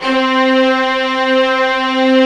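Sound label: HALL STRING; Greytsounds; Scott Peers Sound Engineering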